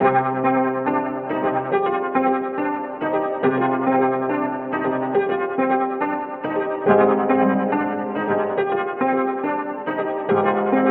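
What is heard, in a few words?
140 melody loop C minor; crashings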